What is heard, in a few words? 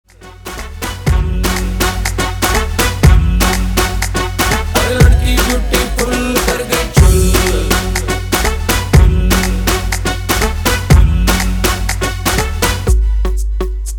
cool ringtone